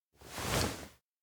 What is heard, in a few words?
bow head; anemoi